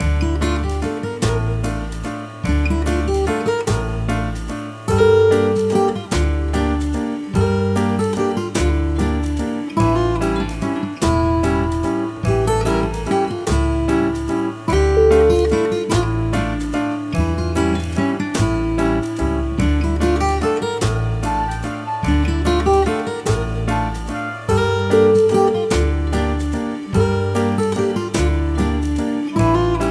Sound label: gypsy waltz